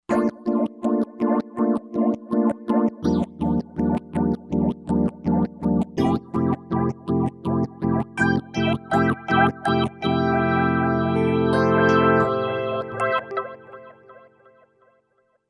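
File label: Echo Roads, synth piano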